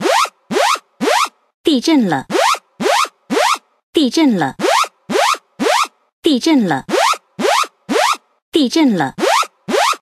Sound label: earthquake; Android; CellBroadcastReceiver; zh